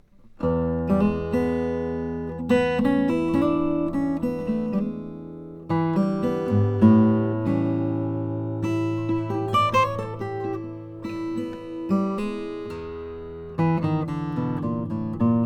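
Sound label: e35 mahogany, guitar; flammang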